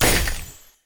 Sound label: sfx skill, ZombieSkill SFX; IdleRPG2024